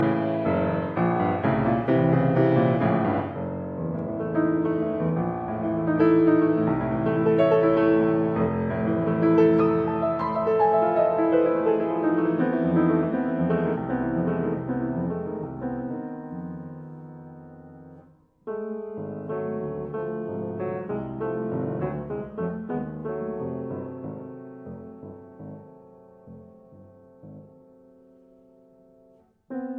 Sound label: Piano Sonata III